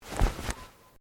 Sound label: Clothing Shirt Cotton Move